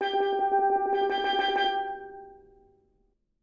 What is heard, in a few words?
tremolo G on steelpan; Calypso